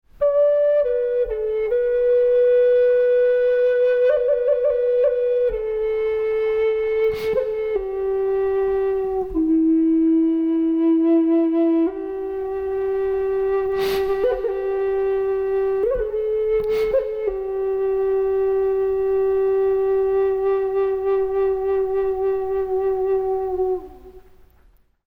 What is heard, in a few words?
cyclic, indian flute